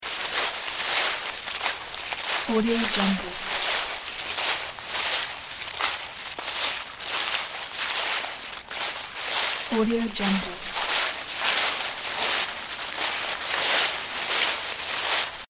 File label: footsteps on fallen leaves